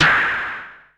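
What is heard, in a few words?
percussion; ZERO-G; Datafile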